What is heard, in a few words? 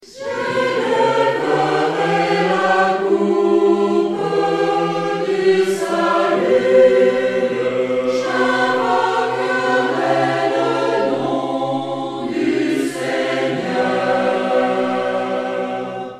Tutti